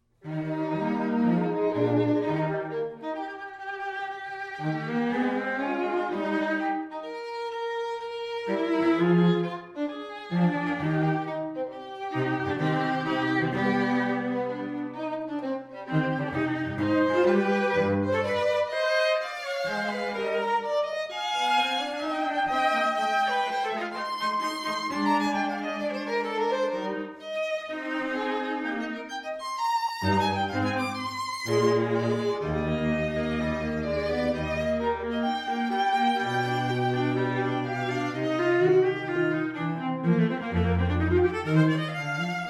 trio

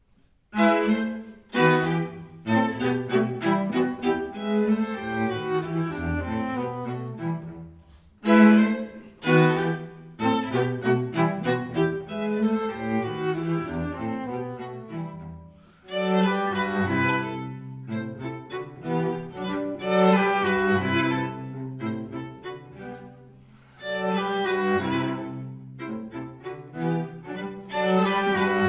trio; sauzay

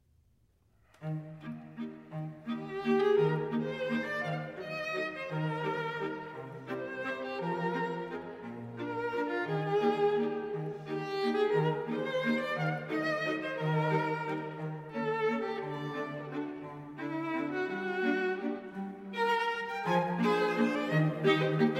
childrens waltz; tchaikovsky

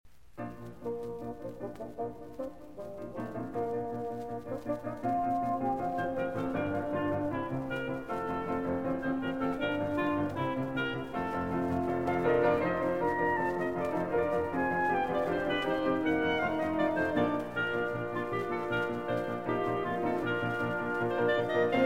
quintet; rimsky-korsakov

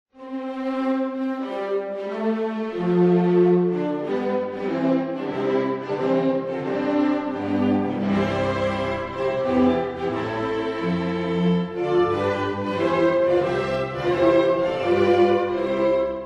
serenade; volkmann

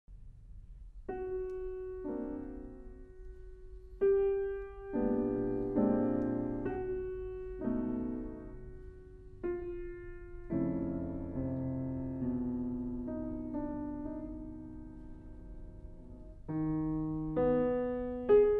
trio; emmanuel

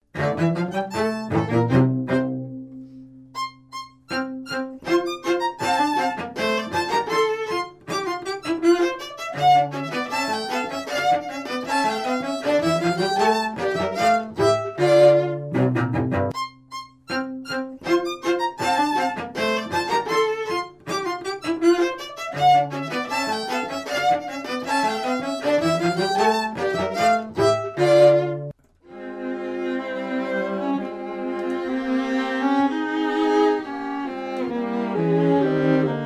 trio scherzino; rontgen

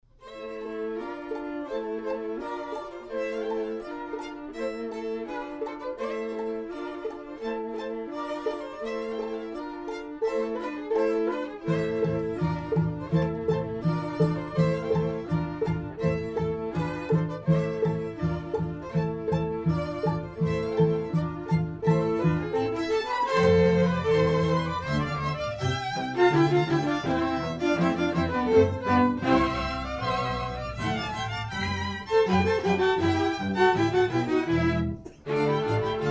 octet

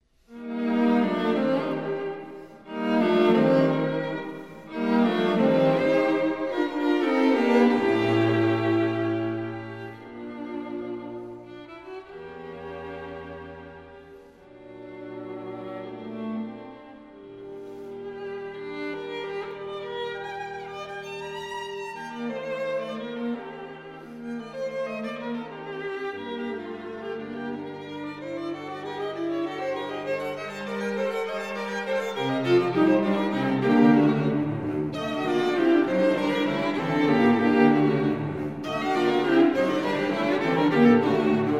string trio

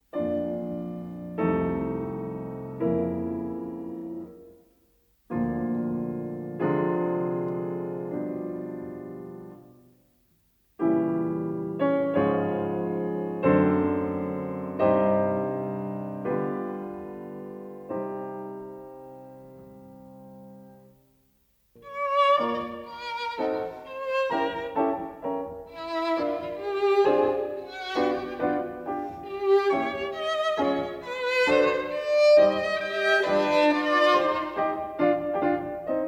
trio; mendelssohn

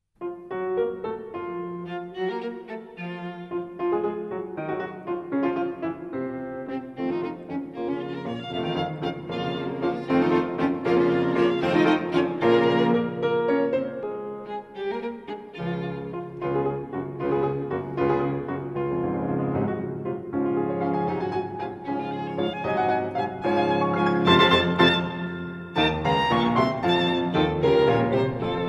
piano trio; brull

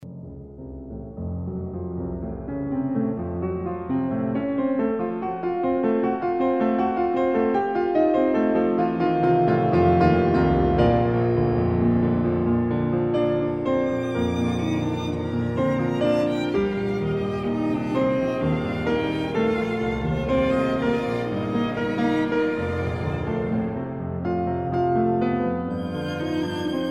piano trio; volkmann

